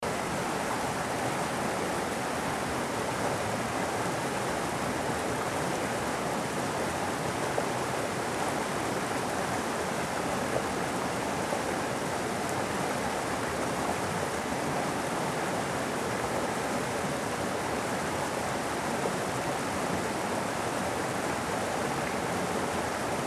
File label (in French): torrent pont des bachassieres